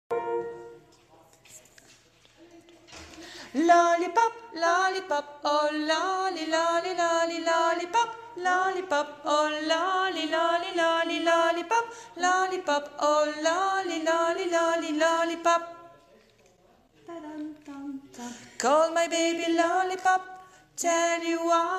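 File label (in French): voix fille médium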